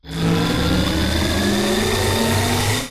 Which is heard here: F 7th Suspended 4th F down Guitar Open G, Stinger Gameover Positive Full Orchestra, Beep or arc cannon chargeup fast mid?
arc cannon chargeup fast mid